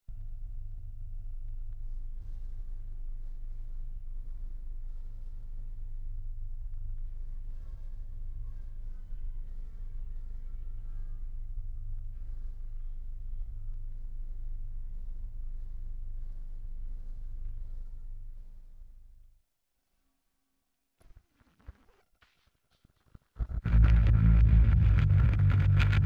bass

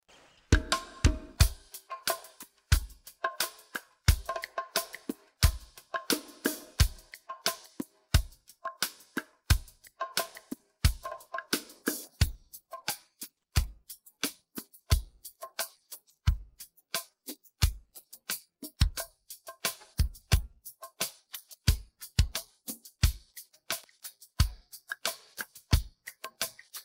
drums

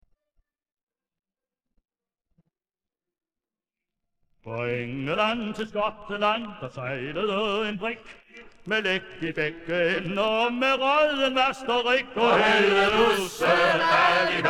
vocals

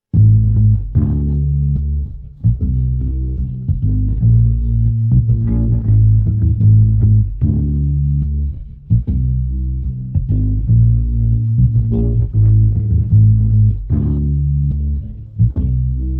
bass

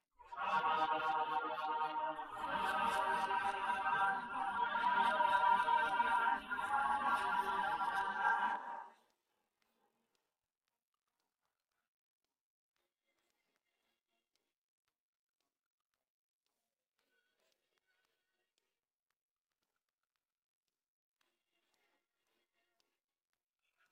vocals noise

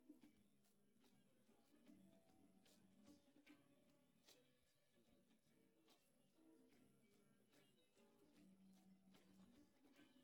piano

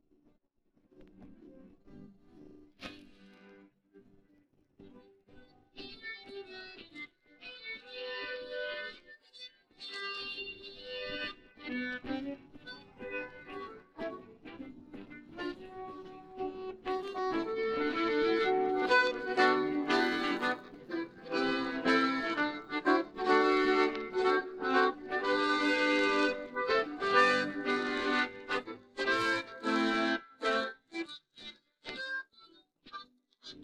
guitar

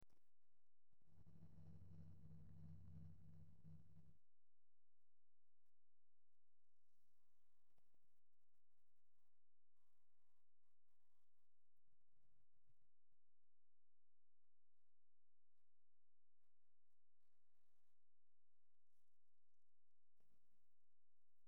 guitar

